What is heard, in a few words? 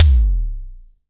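SDS V BD, bass drum; Best Service; Real Mega Drums VOL-1; MONO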